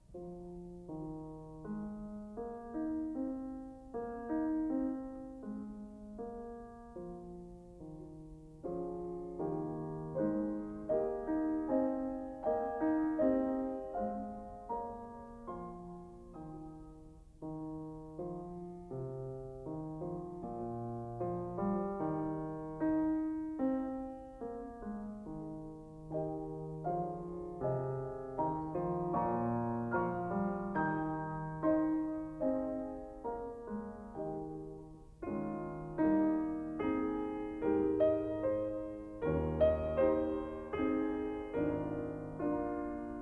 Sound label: Pictures at an Exhibition for piano Promenade; Mussorgsky; Graffman